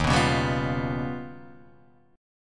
D sharp Augmented 9th D sharp Guitar Half Step Down